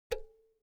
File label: object electronics power cord heavy insert remove